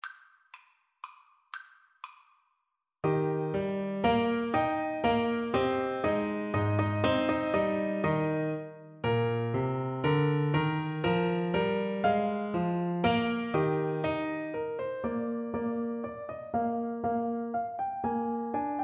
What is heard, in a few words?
German Dance, Bassoon